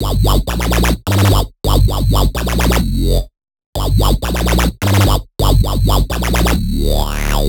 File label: 128BPM Wobble Bass Root G; Vengeance Samples; Wobble Kit